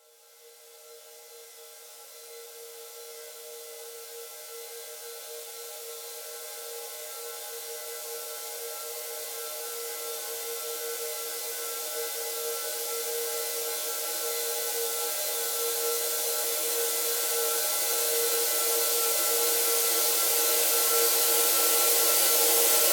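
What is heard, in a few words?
140BPM Ultra Long Reverse Cymbal; FX Fills; Vengeance Samples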